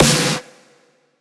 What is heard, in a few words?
bigsnare